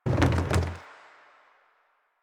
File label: Pylon Plastic Roll; Warframe; Ambience; Nineteen Ninety Nine